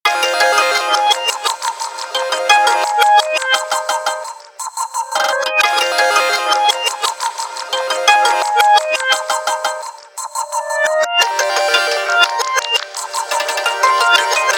Glam, ringtones; Motorola MOTOLUXE-XT615

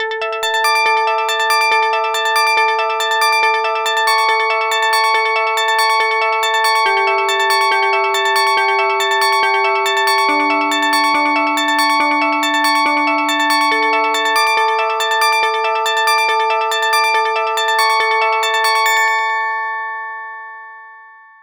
Ringtone data-fax call; PlusFourSix; Josephine (G700)

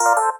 doremi star; SKY IM-A730S-VEGA-S; keytone

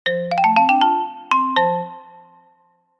marimba, notifications

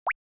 Water Drop Notification Night

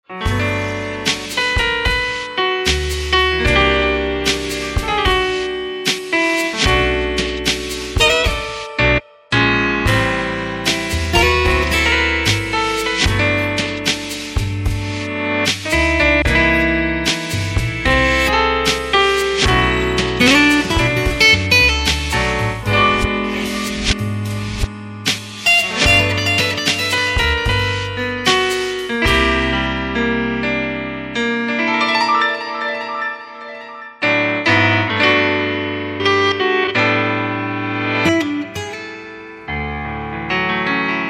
Ring Just Say Yes, phonetones; LG; A310

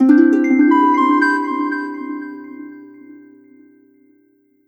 Recorder, notifications; Vivo; E1T

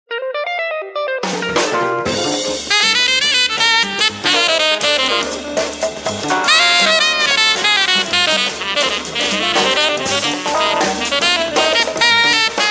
Jazz bar; Samsung GT-M7600 BeatDJ

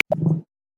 Emu, Australia; Xiaomi Redmi-Note-8-Pro

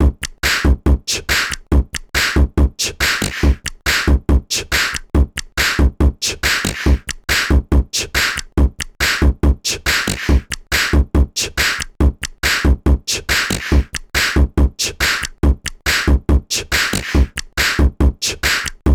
Boxbeat, ringtones; Cubot X6

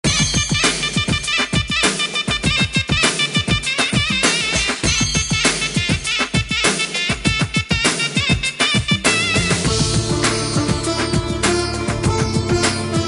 Fast Pace, phonetones Motorola Q9c

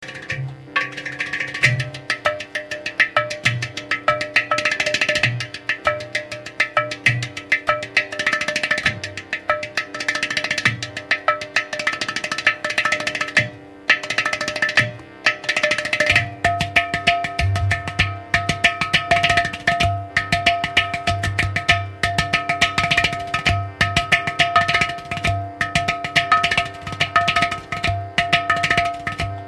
Drum, Melody Sample; Sagem; MY-V-75-CD

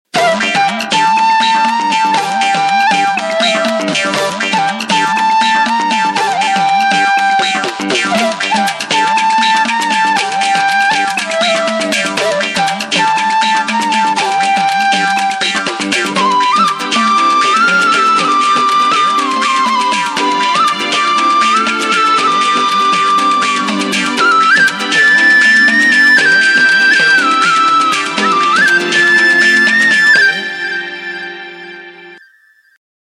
Ringtone, Samsung GT-B3313 Corby Mate

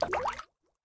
water ripple touch down; LG G Flex2 F510K

